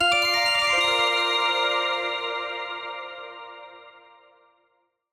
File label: Dreamy Musical Sfx